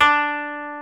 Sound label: KOTO D3; World Combo